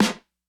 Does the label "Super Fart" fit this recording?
No